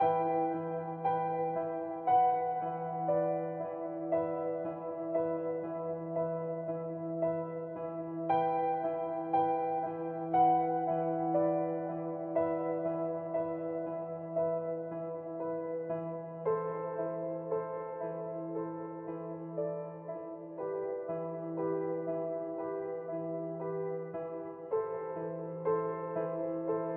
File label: Duck sad music Layer Piano; Dude Stop